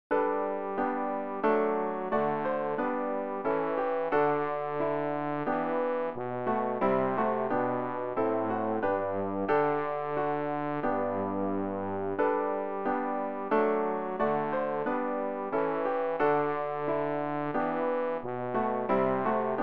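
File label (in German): bass, Übehilfen für das Erlernen von Liedern; rg 159 liebster jesu wir sind hier dich und dein wort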